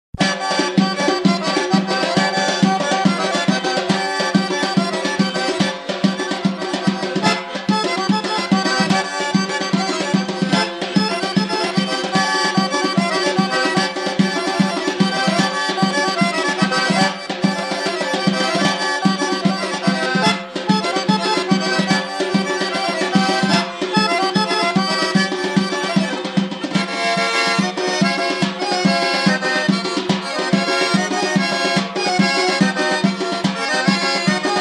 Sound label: georgian folk dances acharuli